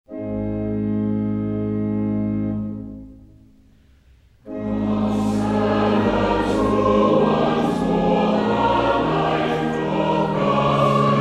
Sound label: Choir, hymns